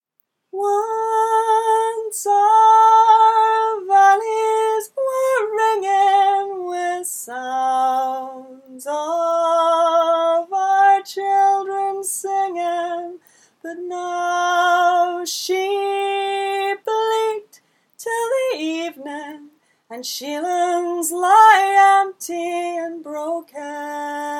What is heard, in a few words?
verse, Folk Choir music